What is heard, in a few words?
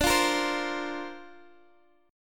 D-Minor 7th Sharp 5th, D, Guitar, Open D